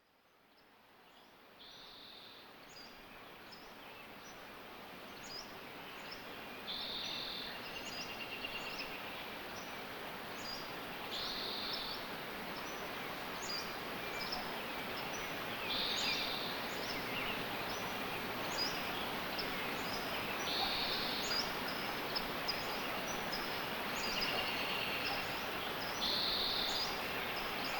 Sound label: Sonoma Ambiance